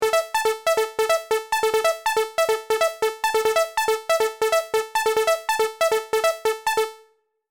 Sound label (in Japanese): シーケンス